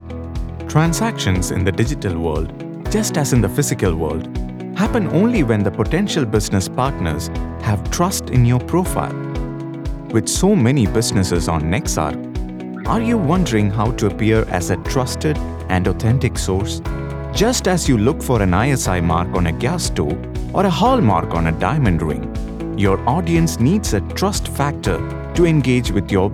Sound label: music